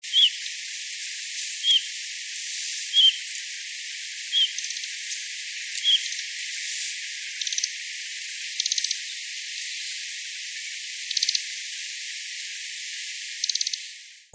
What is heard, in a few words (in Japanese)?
ojirobita